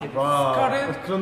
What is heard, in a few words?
skrt